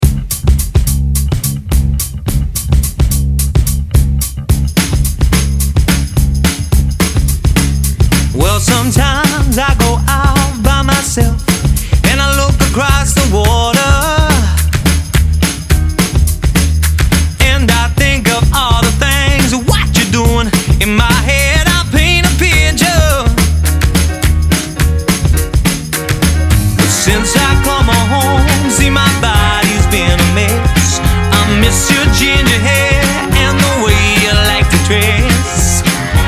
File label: Rock Pop Function Band; London